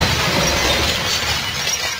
batida de carro

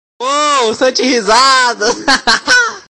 risada

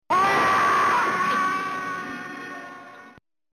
grito; final fight

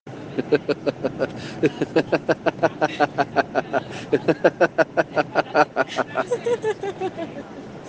risada

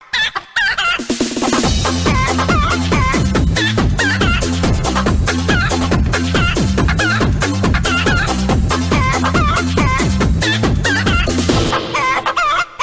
danca da galinha

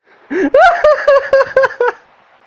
dando risada; preto

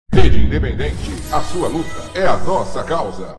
vinheta de abertura; Rede Independente

